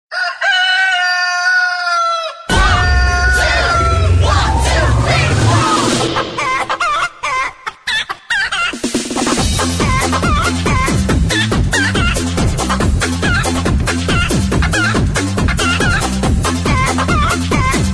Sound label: rooster ringtone; android